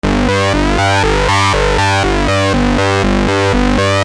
Syn Bass 120 G, Techno Synth Loops; SynBs; 120bpm; Sonic Foundry (Sony Creative Software); Signals I

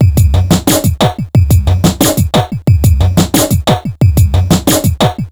bassline drum 090bpm; Zero-G; Downbeat Dance Grooves

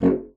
F#1, bassoon; Xsample VOL-6; C BASSOON

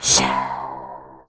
chaah, tubevox dry; Q Up Arts; Denny Jaeger Private Collection

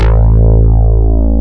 DIGI BASS; DIGI BS

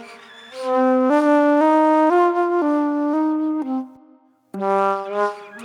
Flute Noir

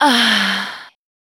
VOC F, Dance Vocals, FEMALE

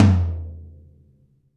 TOM, Roland, Real Kit; 90 s Sample CDs; Sound & Vision - Gigapack I CD 1; Real-Kit s 4

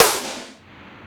Tool Kit, Drum Machines

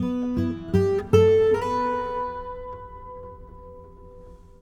Beach La La, Acoustic String; Sonic Foundry (Sony Creative Software); Friendly Fire

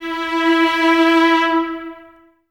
String Ensembles, Violas

